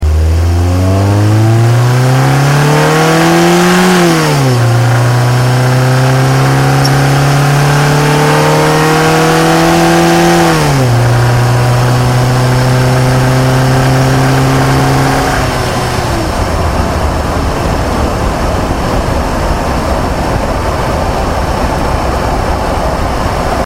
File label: Alza Acceleration Outside